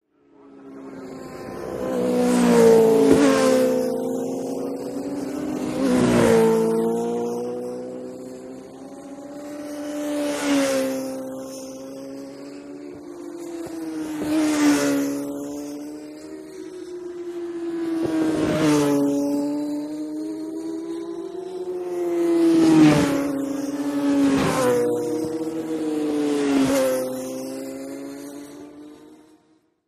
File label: Motorcycle Road Racing